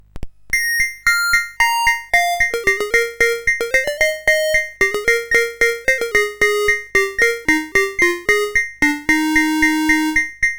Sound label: Season Jingles, CSG 8580